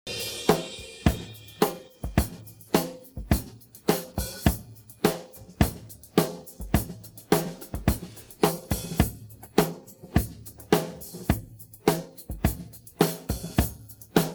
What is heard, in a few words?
pattern, drums